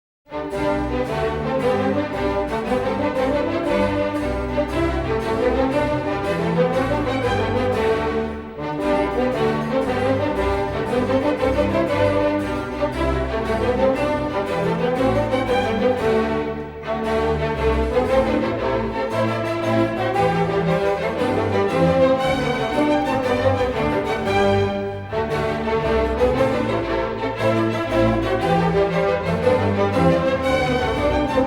Water Music Suite No. 3 in G Major IV

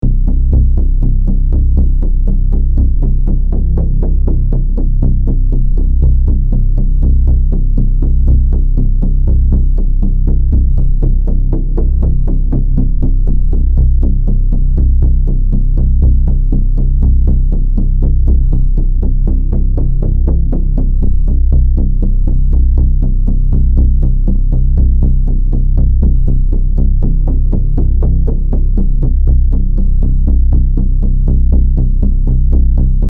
pad high tension and suspense background filler

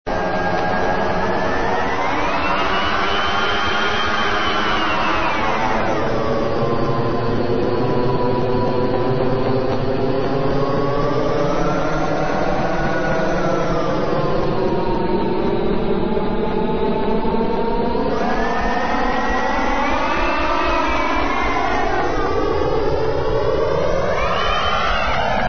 space loop

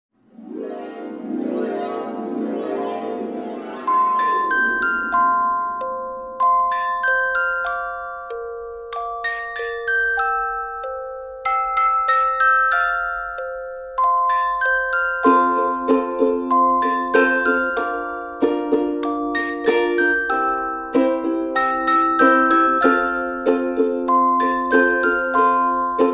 Jingle bells

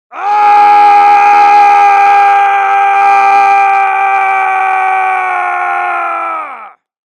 Scream Long

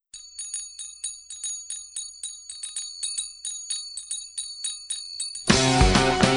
morse

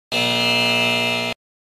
ERROR

